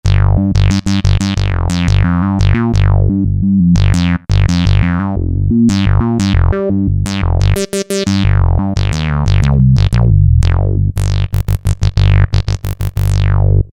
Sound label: Velo Decay Bass, Roland JP-8000; Factory Patches